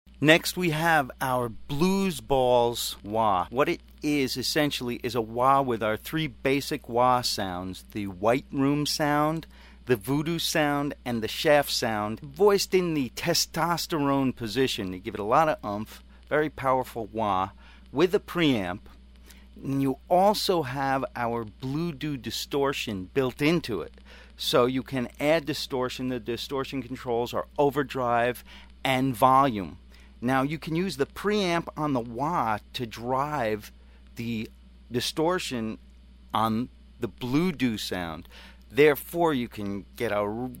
blues bawls intro

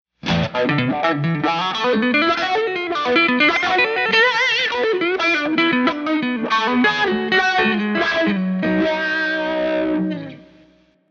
Reverse Stereo Wah

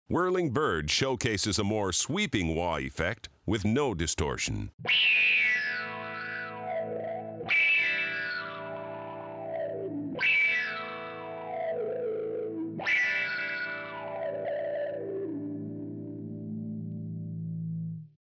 Whirly Bird

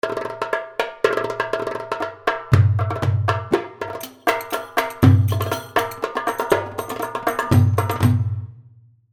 Samai Thakil 120, darbuka

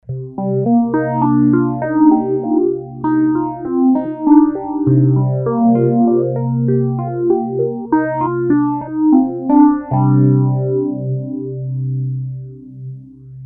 wurli stage

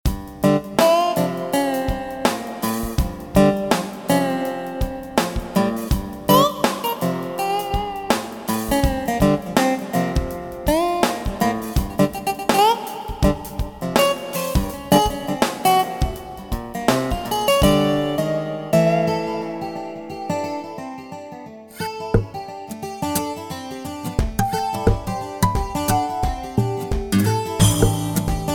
Guitar

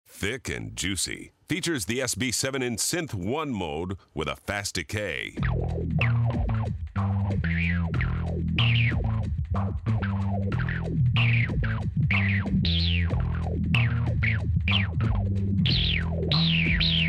Thick And Juicy

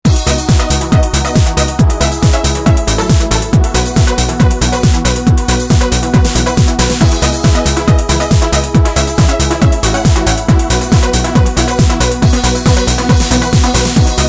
Trance

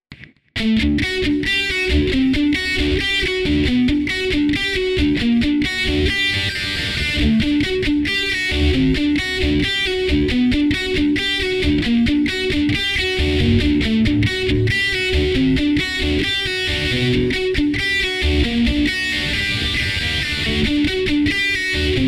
80s heavy

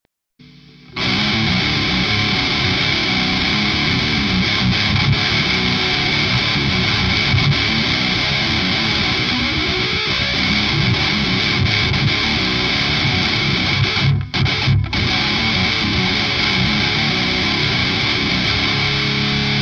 Cyclone Dirt Extreme Scoop

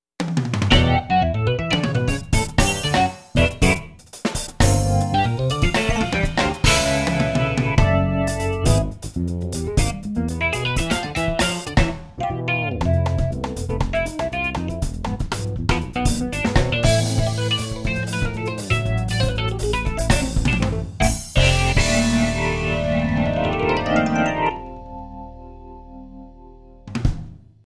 Electric Piano

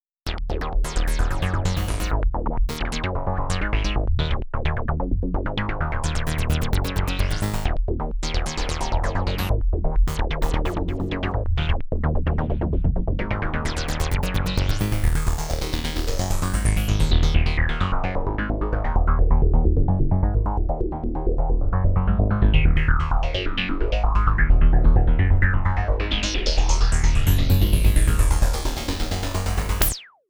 New Ager Bass

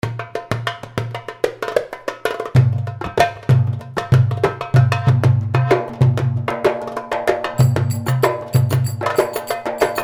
Rai, darbuka

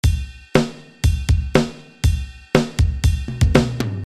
rock kit

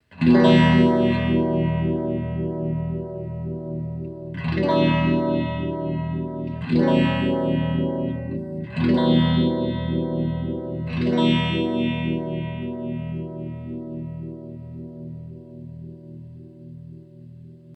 Tremophase; Red Witch

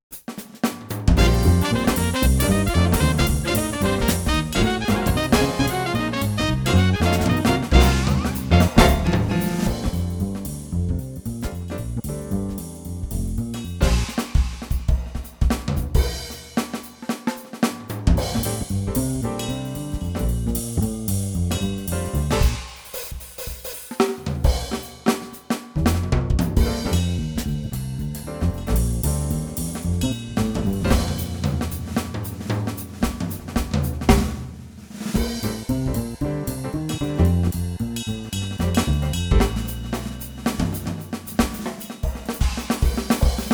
Be Bop

